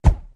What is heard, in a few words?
Flash Bulb Pops Poofs